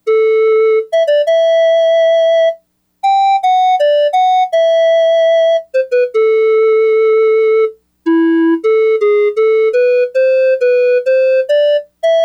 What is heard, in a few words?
Pan Floot, Yamaha DX100; Factory Patches